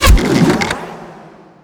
shackleshot bind, weapons; windrunner

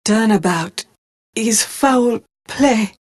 death; dota; phantom assassin

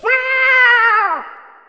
taunt catdance; weapons; dota; luna

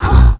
grunt, roshan; weapons; dota; creep

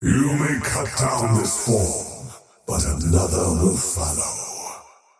death; dota; faceless void

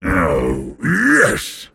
move pain; pudge

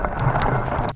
move, movement; ogre magi

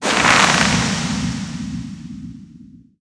bramble cast target; weapons; dota; dark willow

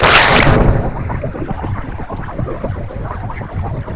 mana drain; weapons; dota; lion

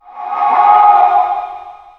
death ghost fellbeast; dota; creep deaths

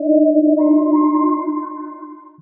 wisp active; weapons; dota; keeper

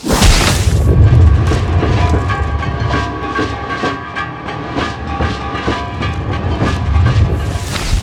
deathward build; witch doctor

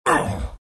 pain; beastmaster

